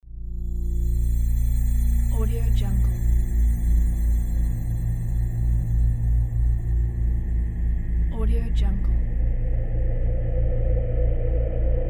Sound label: adrift in the void ambiance